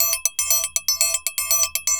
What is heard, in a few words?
TRIANGLE, SAMBA; World Grooves; DRUMS; Best Service ProSamples vol.14; AKAI